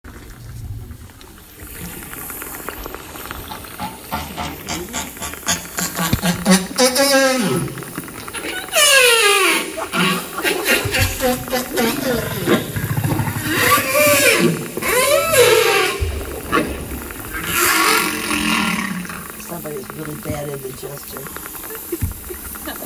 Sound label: Whale sounds